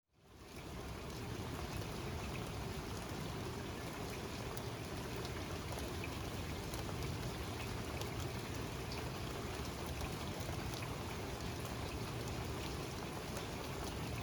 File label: rain on the deck; prettysleepy art